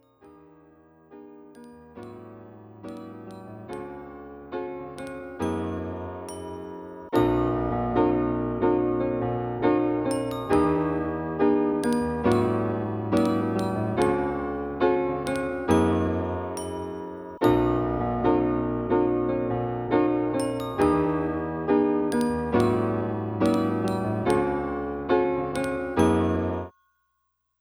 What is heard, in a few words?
Instrumental